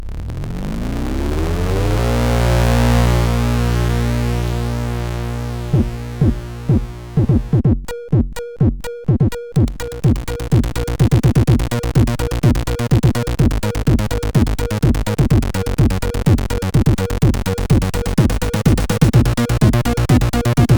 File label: intro, CSG8580R5